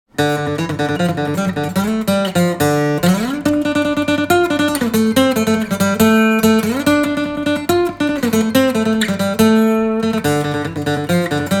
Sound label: One String Love Song